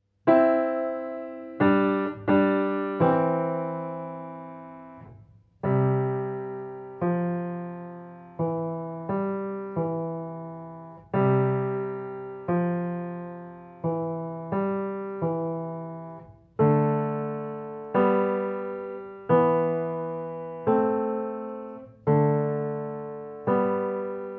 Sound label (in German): Wiegenlied; Playalong